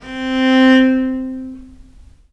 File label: VIOLE MUT C3; Historical Instruments; USB Soundscan vol.23; AKAI